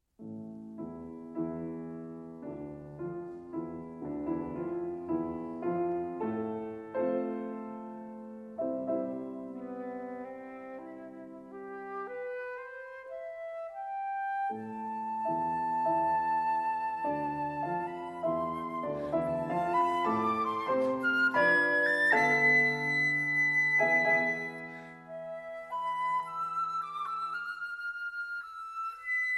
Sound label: Sonata For Flute and Piano - Mvt. 1. Allegro moderato